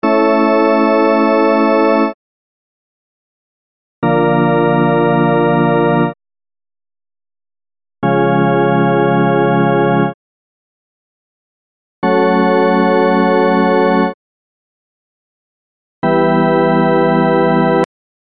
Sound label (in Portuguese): acordes maiores e menores A Bb C F E C